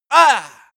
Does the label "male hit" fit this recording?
Yes